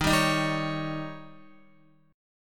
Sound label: Eb 7th, Guitar; strummed